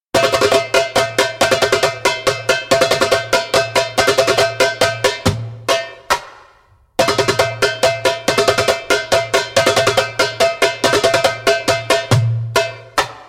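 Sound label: Rotating Hips (slow) spoken, djembe; Djembolale - Fills and Drills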